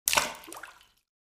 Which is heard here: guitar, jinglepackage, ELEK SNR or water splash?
water splash